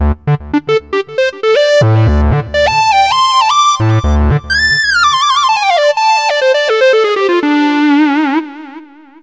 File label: Square Lead LP12